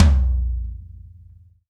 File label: mid tome drum sample c key